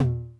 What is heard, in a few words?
Tom Low High Tune Mid Decay, 909